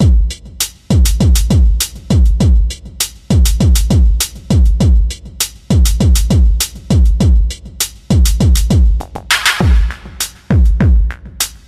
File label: Rock Techno 100